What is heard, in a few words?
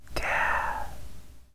death